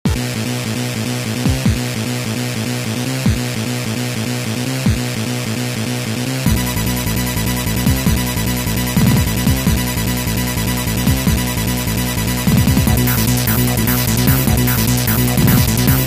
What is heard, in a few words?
forest, music